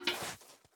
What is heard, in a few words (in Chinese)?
桶装入细雪声